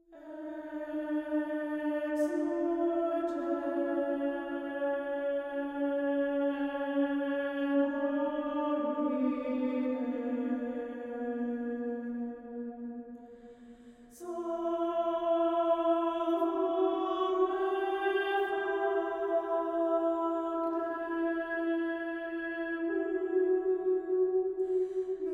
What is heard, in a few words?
Choir